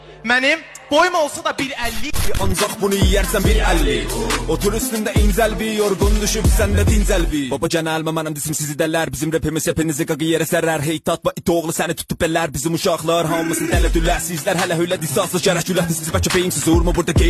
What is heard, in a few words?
rap